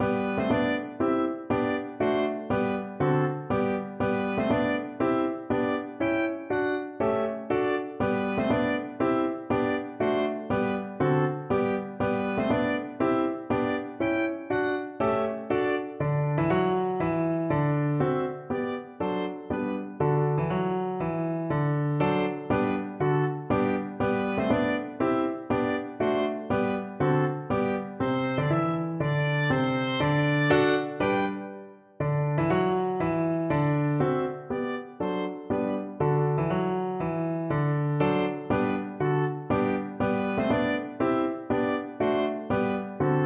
schumann soldier, oboe

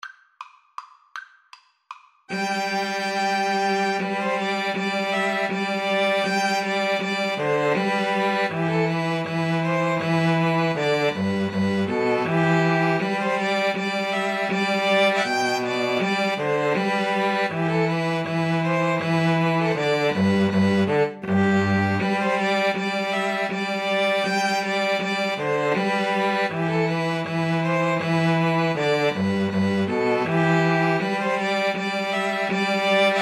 slavonic trio, 2 Violins Cello; Dvorak, Antonin

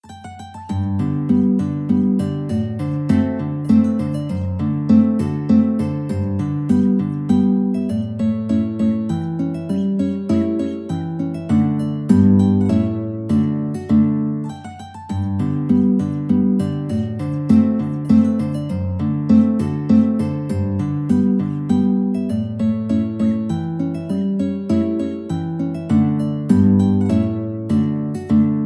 Boccherini Minuet duet, mandolin guitar duet

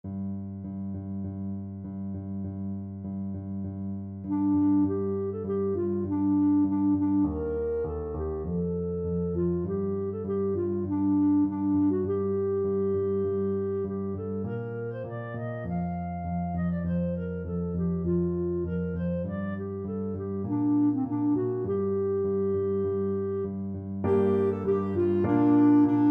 jacobites, clarinet

